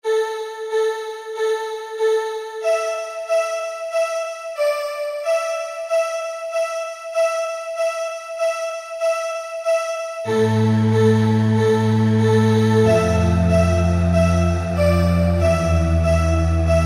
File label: SYN. VOX, Guitar And Keyboards